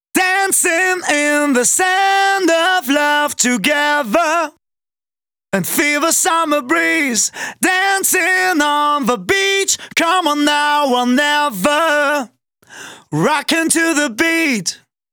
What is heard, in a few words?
Male Vocals DRY, 128 BPM; Vengeance Samples; Vocal-Hook Kits; Song Kit